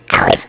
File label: eat; DinningPhilosophers